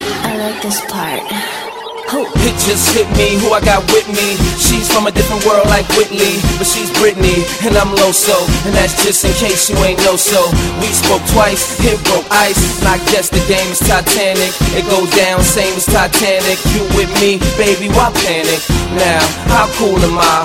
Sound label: Crying